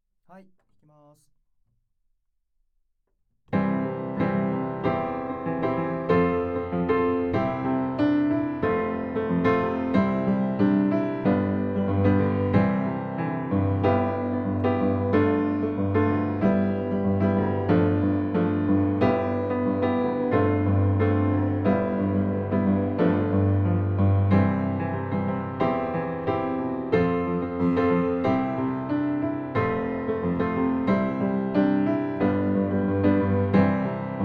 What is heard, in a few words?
Piano PM40